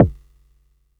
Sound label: kicks